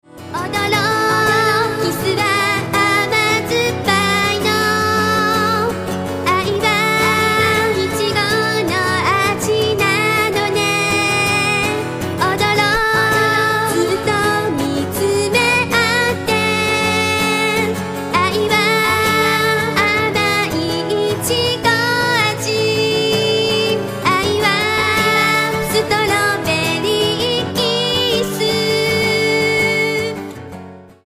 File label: piano